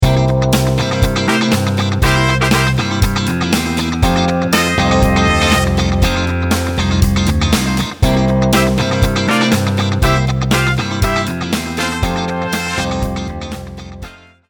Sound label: funky horns